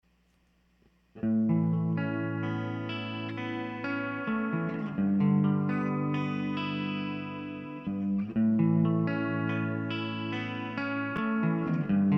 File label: pad Gtr Spark, mic tests